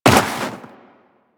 Tenno Walk Snow, Footsteps; Warframe

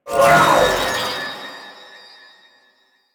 Whirlwind Speed Activate; Warframe; Caliban

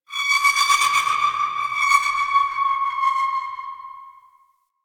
Distant Metal Textures, Asteroid; Ambience; Warframe; Grineer Asteroid

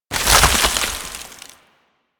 Crystal Growths Detach; Warframe; Citrine

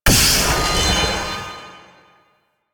Wave Ability Charge Up; Enemies; Abilities; Warframe; Duviri; Dax Knight

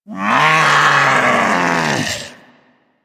Vocal Death F; Warframe; Hunting Critters; Vulpaphyla

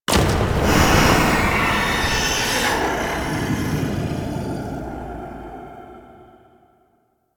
Int Atrium Wind Blast; ambience; Warframe; Entrati

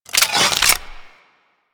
Sword And Whip Equip; Warframe

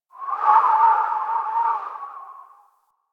Wind Gust, Ambience Plains; Warframe; Eidolon Plains; One Offs

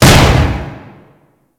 Contained Glass Hit

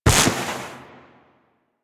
Step Snow; Enemies; Foley; Footsteps; Warframe; Duviri; Dax

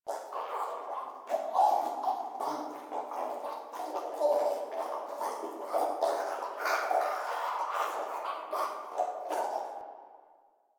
Interior Critter Loop; Warframe; Infested Eris; Ambience

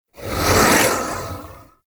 Archon Whip Ranged Attack Projectile; Enemies; Weapons; Warframe; The New War; Nira

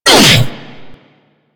Hammer Boss Pain, Vocals; Warframe

